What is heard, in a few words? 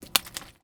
Foley